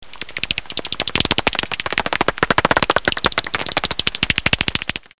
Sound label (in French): pipistrelle; CHAUVE-SOURIS; gewone dwergvleermuis; WWF Rangerclub